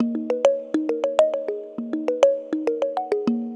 Tongue Mallet; Melodic; Ambient-Melodics-II

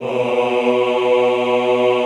AHH B1, Classical Choir; Best Service; Extended Classical Choir

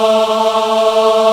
Aahh Chor, VOICE; Best Service Gigapack 1

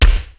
Bassdrum, Korg T3

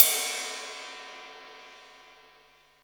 Boss DR-660 Ride, Drum Machines

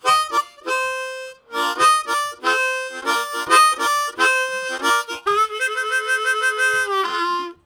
Roller, Harmonica, Riffs; Zero G

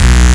STACK03, Big Beat Samples; AKAI; STACKS KIT

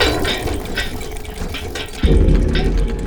CLANK; ENVIRN-AMB

